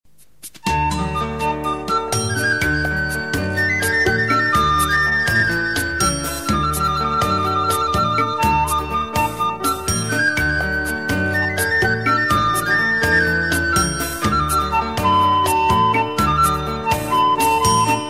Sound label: Sinhala Instrumental